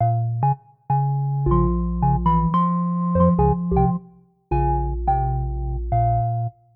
tonewheel

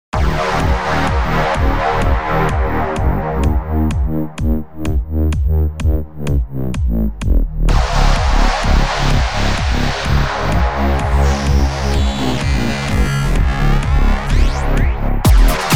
edm; eclipse lunar

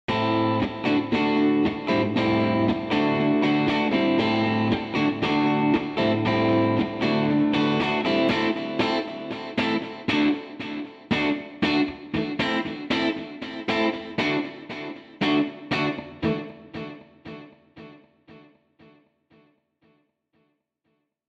dry not effected

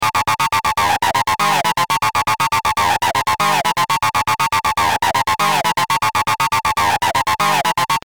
acidbox master left slave right

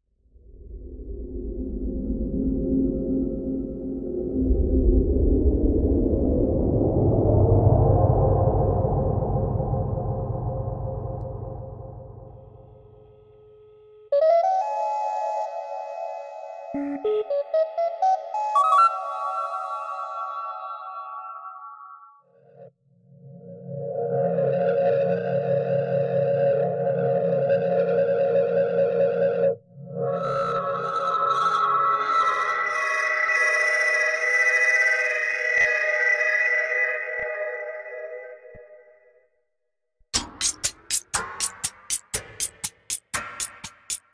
short noise sound fx

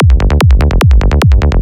sequence kick melo 148 bpm

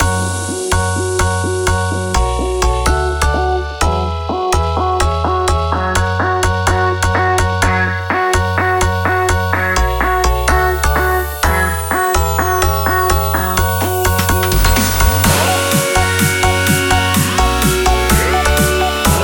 kit mix 126

